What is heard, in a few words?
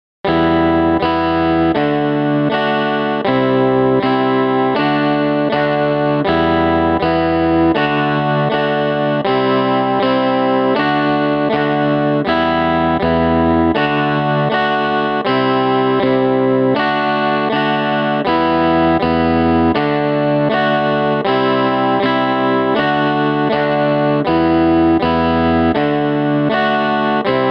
80 bpm guitar